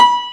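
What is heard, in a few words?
acoustic piano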